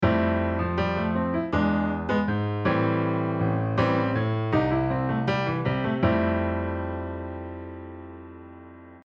oshare rhythm

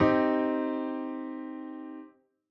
tri minor

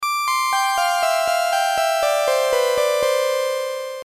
Env Syn Bell

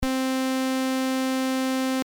Main Lead vco

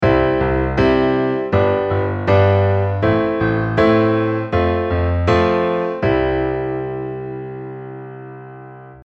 8 beat

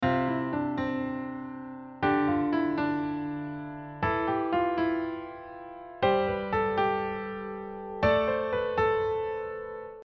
sus2 sus4

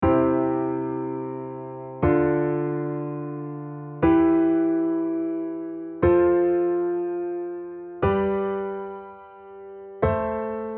chord cadence

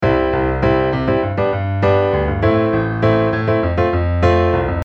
shake beat

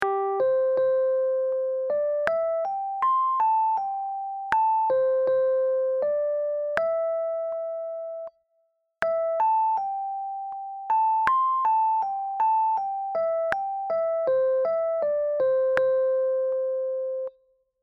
akatombo melody

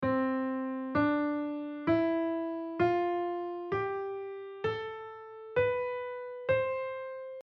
C major

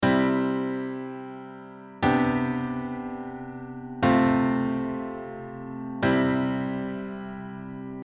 onchord

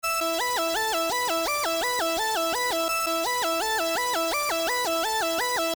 Noise Lead solo

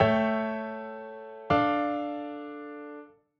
VI7-IIm

